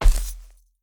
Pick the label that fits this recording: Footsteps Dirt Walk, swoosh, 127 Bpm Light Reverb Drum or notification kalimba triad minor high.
Footsteps Dirt Walk